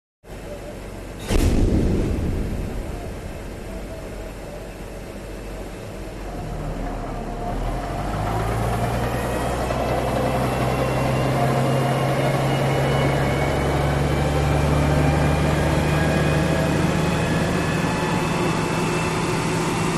Bulldozer; Blade Drops Hard On Ground, Then Maneuvers With Brake Squeals.